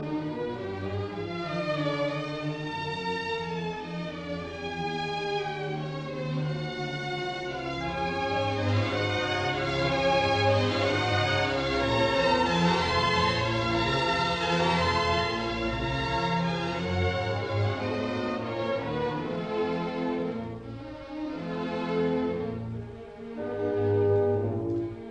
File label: Serenade in D major Scherzo Allegro non troppo Trio Poco piu mot; Brahms; Op 11